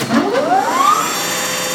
START UP, industrial; Greytsounds; INDUSTRIALFX

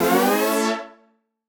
A, Poly Chord Hits, Ramp Up; future rave

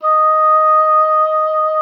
D#5, OB D.AMORE; Xsample VOL-7

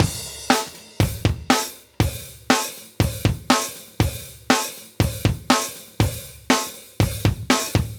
Live Breaks, 120

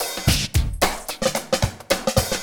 HELP, LOOPS; Best Service; Hyper Dance

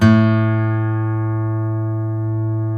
STL NV A 2D, FOLK STEEL Guitars; Spectrasonics; Hans Zimmer Guitars VOL-1

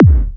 BD, Dance Mega Drums; Best Service

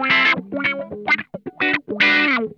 RUDE WAH, Funk Guitar; 90 s Sample CDs; Zero G

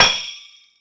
Stadium Rox, Drum Machines, E-MU Pro-Cussion

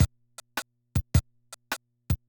Loop, classic machines, rpm 40

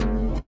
LIQUITAR; Spectrasonics; Metamorphosis

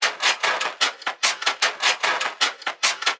Weird Perc Loop

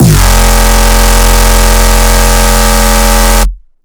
NEURO PUNCH SCREEE, bass

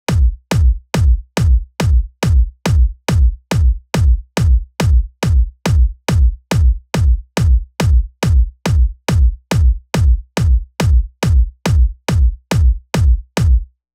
140 BPM Drum Kick; Vengeance Essential Clubsounds Vol.5; VEC5 Demo Constr Kits; Nessi Kit; Root A; Drums & Perc

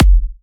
Electro Kicks

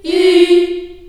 F3, CHILD, Choir; CHILD IHS

